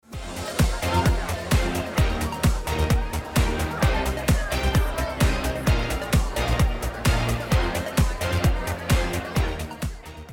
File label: music formal loud; Fall; Equestria Girls